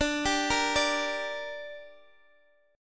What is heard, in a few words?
D Suspended 4th Sharp 5th D down Guitar Open G, chord